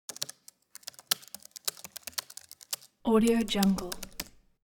foley office keyboard typing; smartsoundfx

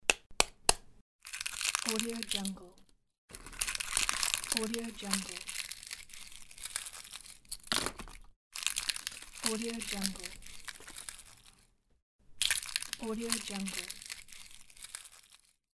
peel egg shell

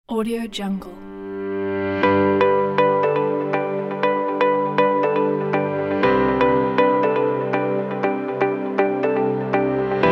optimistic corporate

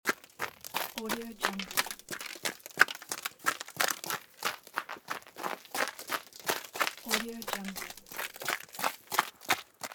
running on snow; welltone

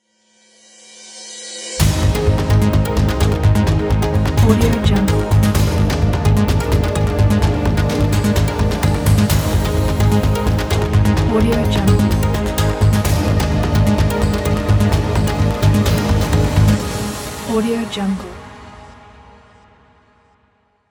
news opener intro

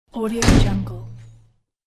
thick metal door shut

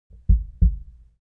human body heart beat double; smartsoundfx

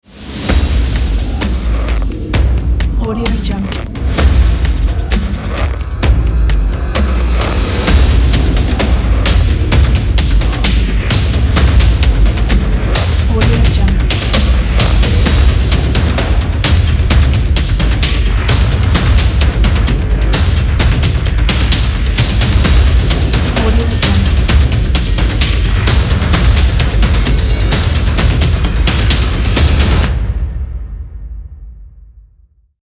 epic percussion

